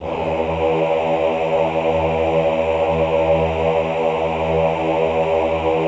AHH E1 R, Classical Choir; Best Service; Extended Classical Choir; AHH LO+HI LL

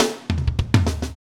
Fills; AMG; Now CD-ROM (Roland)

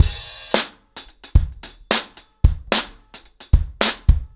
110BPM, acoustic drums, drumloops

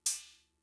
Cymbal; Fricke MFB-522

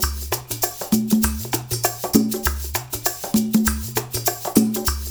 LOOP, percussion

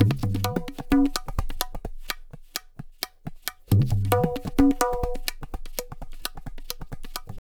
Pure Tabla, 130BPM; Zero-G

